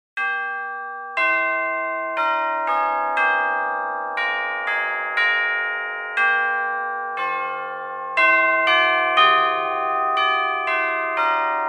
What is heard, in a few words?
Chimes Of Gloucester Cathedral